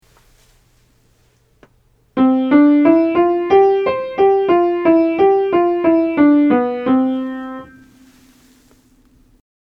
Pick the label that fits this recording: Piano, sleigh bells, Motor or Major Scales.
Major Scales